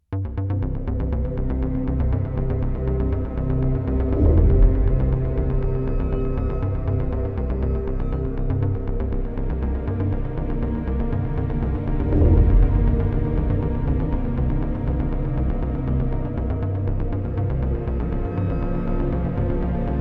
Pulsing Tension

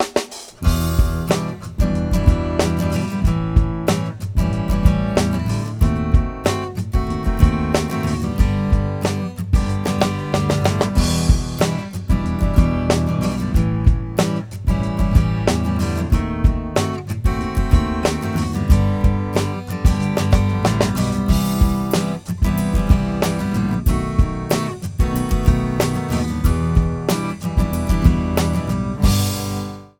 In A Fine Funk, no keys

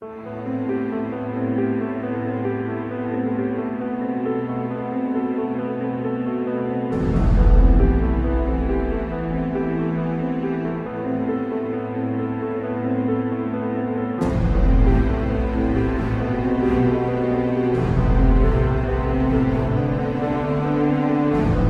Tension Building

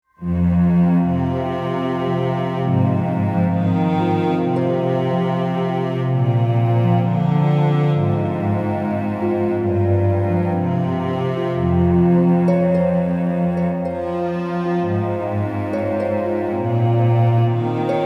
Sad Sea

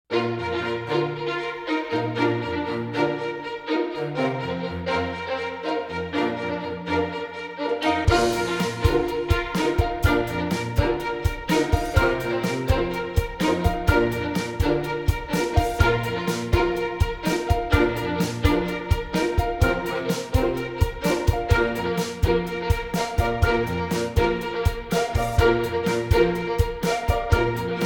Joyful Strings

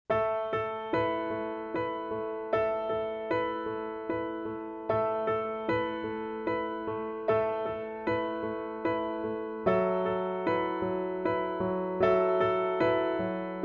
solo piano

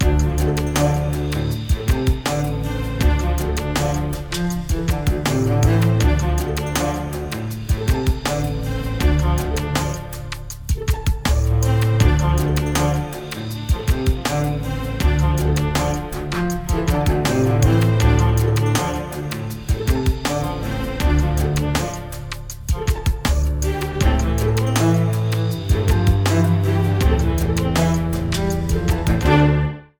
String Drama

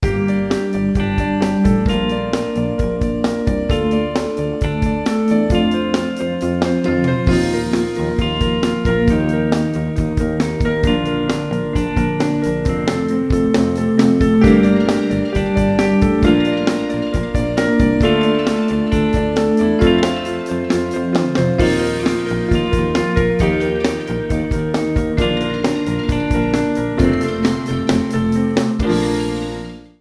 Fun Ride (no ac gtr)

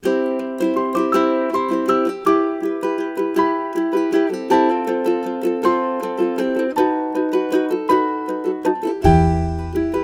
Sweet Uke Song Full

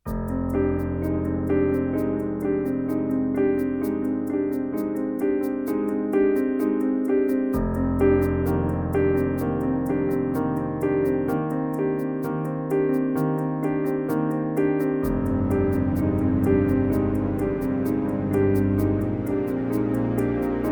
Dramatic Unfolding, tension mounts

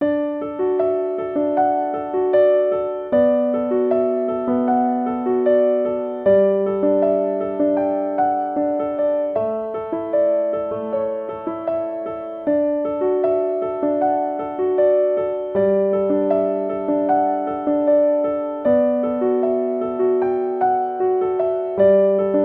solo piano; piano poems b

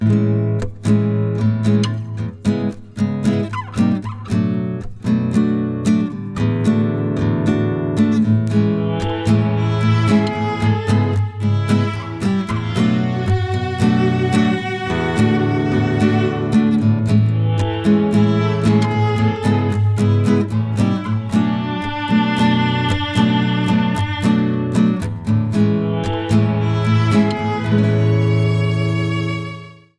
Jazzy Interlude (no drums)

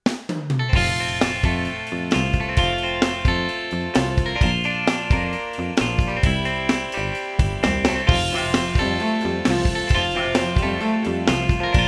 ALT (no ac gtr)

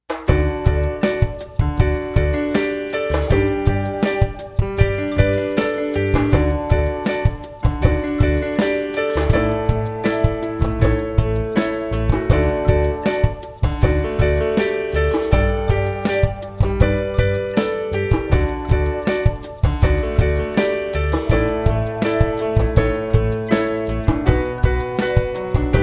Sweet Cute Sweet

